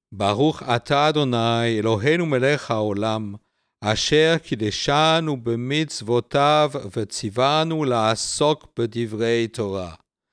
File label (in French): Benediction pour l'Etude; la liturgie du chabbat; offices de chabbat